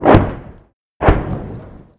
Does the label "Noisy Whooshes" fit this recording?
Yes